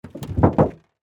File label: Impact Automobile Hood, Buckle; Crashes; BlastWave